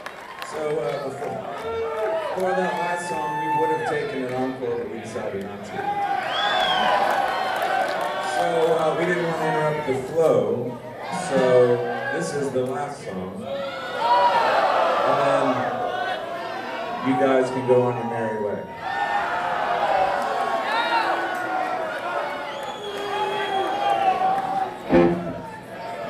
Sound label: Encore Break